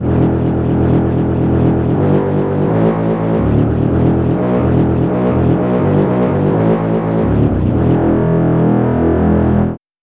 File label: brass section bass